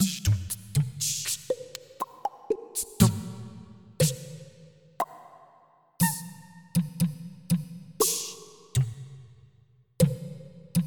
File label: VOICDRUM; CREATIVE